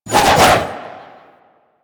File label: Arch Wing Sword Spin Whoosh; Warframe; Weapons